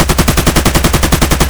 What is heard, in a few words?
ak105 close loop; Escape from Tarkov